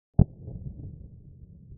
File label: Bangsnap Pop Pitched Heavy